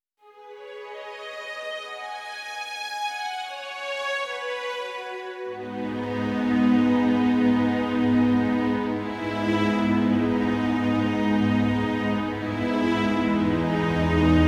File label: Cinematic Strings; Survivor